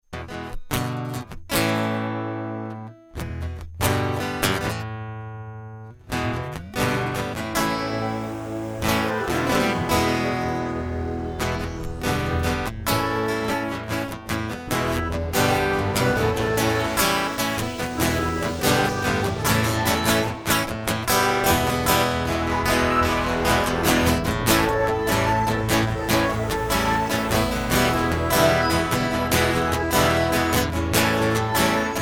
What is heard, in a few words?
Heartbeat Interlude